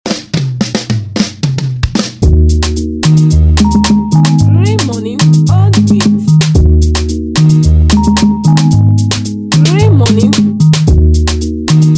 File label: Instrumental